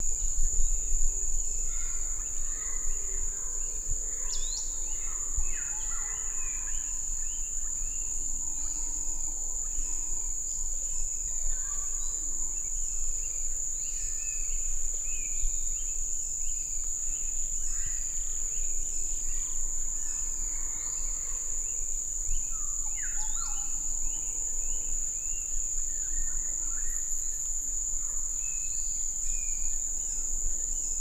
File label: Forest Soundscape, El Silencio